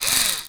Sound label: head spin, dog; npc; scripted